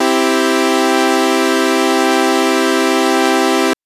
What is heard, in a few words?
Synth Saw maj c3; TrashLibrary